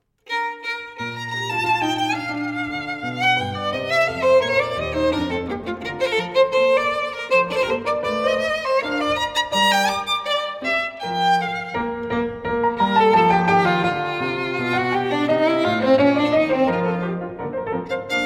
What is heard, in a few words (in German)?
Sonate B-Dur, Allegretto